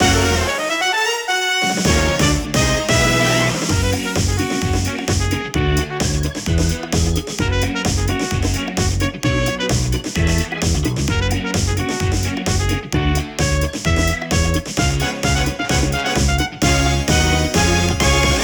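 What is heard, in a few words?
BGM Opening Jump; Super Mario Party Jamboree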